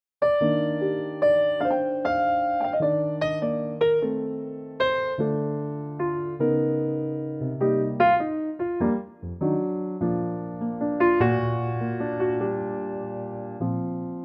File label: Bb piano; key - Bb - vocal range - F to Bb